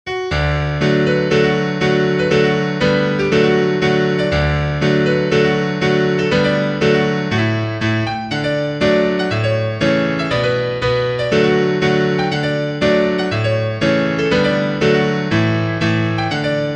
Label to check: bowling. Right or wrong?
Wrong